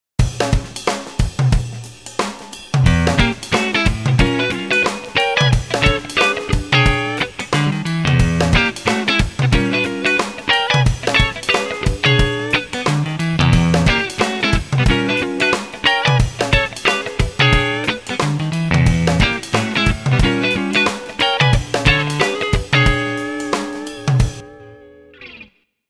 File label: Rhythm Guitar